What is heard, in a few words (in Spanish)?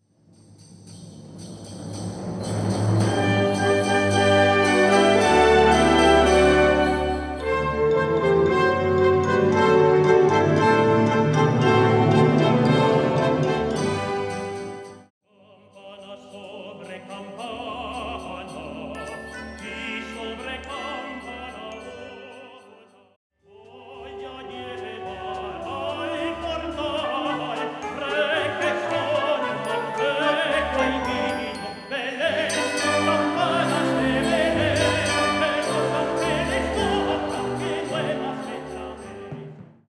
CAMPANAS tenor banda; villancico, para soprano o tenor y banda; CAMPANA SOBRE CAMPANA; Fernando Bonete Piqueras